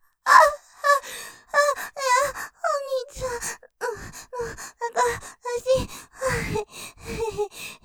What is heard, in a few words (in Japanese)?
絶頂余韻 荒い息で, ASMR; 立体; ステレオ; 中出しソムリエ嬉しそうに; ループで楽しむ！シェフの気まぐれ抜き抜きコース